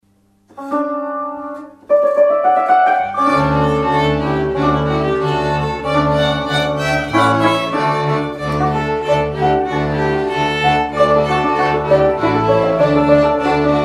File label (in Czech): Pásli ovce karaoke